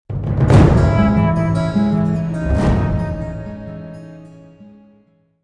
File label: lost round; ambience